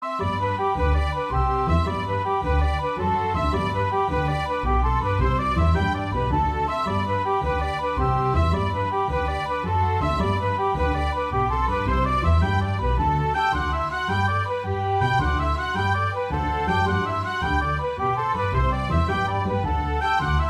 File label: Two Slip Jigs